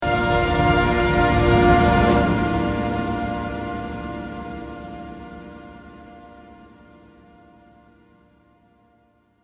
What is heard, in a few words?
Space EMT250